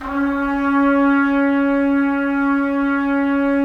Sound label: Trumpets; Roland - LA Composer vol. 4